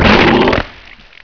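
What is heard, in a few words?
alien grunt die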